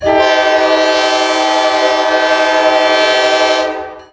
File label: horn